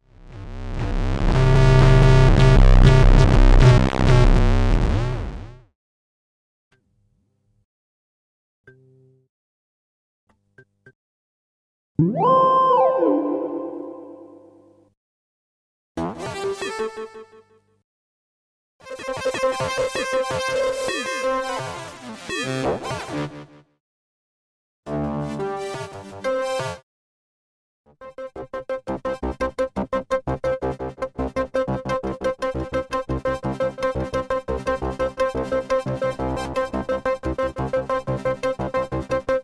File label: Kaossilator